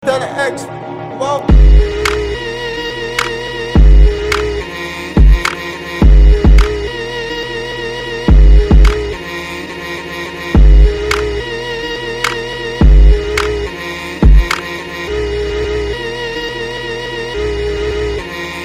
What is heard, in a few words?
Hip-Hop